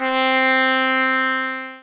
sax

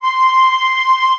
flute

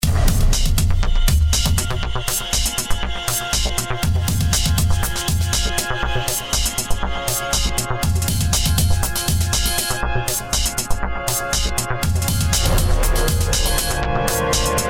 digital rain

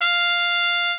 mexican trumpet

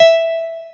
piano

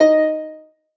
lyre

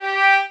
violin

